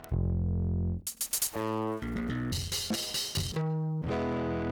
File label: Saturator; Virtual Analog